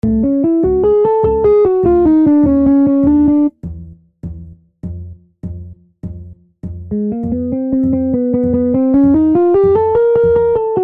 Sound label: Lost at Sea Melody